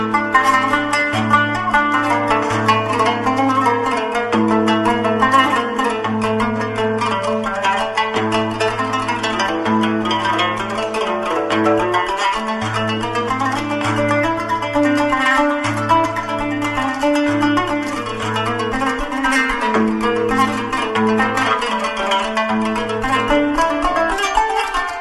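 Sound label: Turkish kanun ringtone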